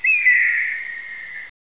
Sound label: eagle cry